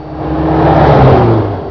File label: CAR PASS; TRANSPOR